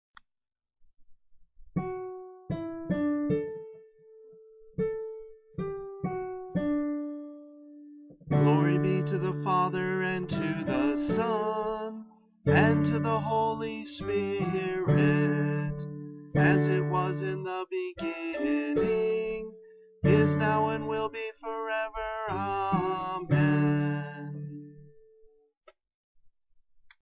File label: Chant tone A (D maj.)